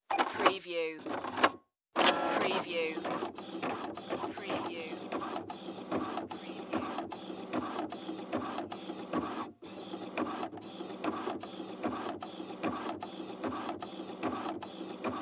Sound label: Fax Machine Print; sound-effects-bible